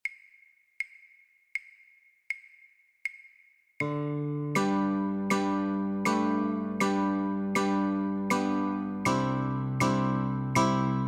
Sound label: Notes & Chords, Guitar; christmas